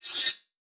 Multimedia Internet CD-Rom Flash Element

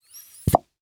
Industry Tool Plunge Suction On Cement

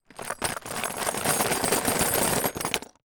Foley Metal Clamps Plastic Lid Rattles

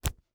Impact Cloth Hit Rag; Impacts

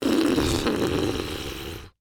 Cartoon Balloon Fart

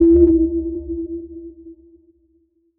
Transition Bell Warble; Production Element Title Transition

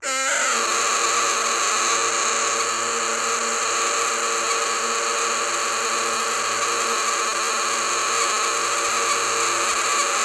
Foley Whistle Blown In Tube Long

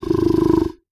Animal Mammal Herbivore Buffalo Snort; animals; AdobeCC

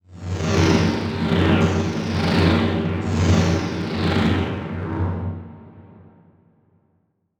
Transition Blast Pulse; Production Element Title Transition

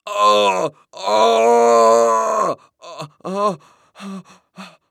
Human Male Yell Grunt Breathe In Pain

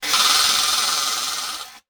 Title Transition Delay Short

